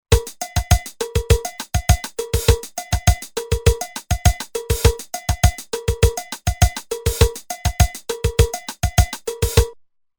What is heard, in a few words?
Bossanova, Roland TR-505; Factory Rhythms